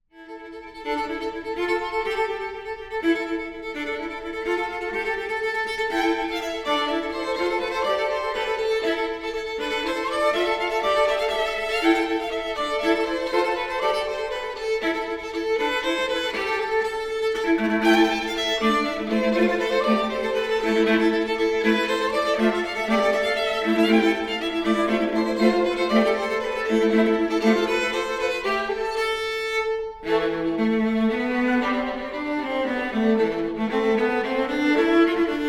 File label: Swedish Polska